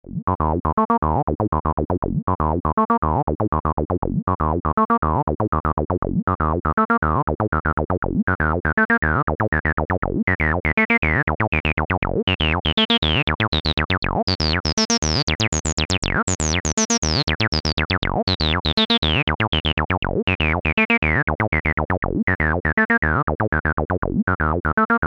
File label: Dist Opamp 21 Acid Bassline; ARTURIA